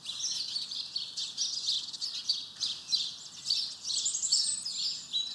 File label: bird; Mafie 1